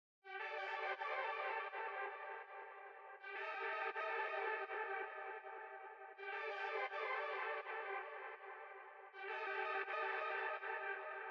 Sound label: 162 BPM G Min Arp, Loop Stems; Cymatics; 2022 Melody USB Expansion; Trauma